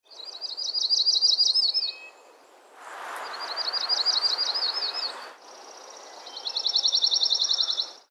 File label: JUNCO DARK EYED; species/birds; wbba